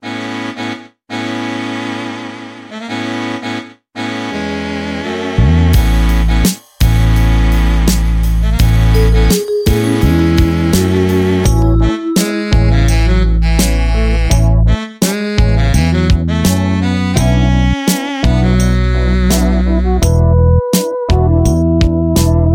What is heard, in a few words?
Lounge